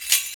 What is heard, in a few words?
Chimes & Seeds, shakers